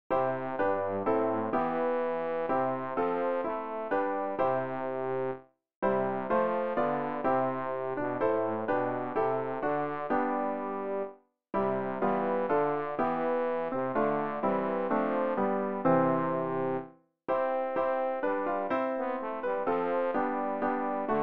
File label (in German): bass; Ref. Gesangbuch; RG 466: Gelobt sei Gott im höchsten Thron